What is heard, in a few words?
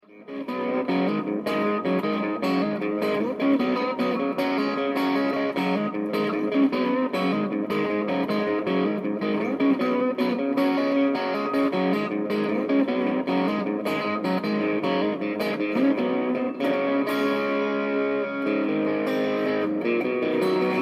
Short delay, Analog Delay